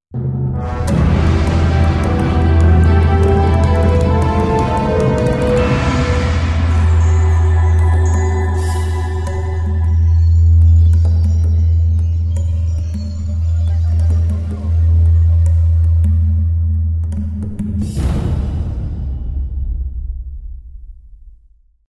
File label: Night Elf Victory; warcraft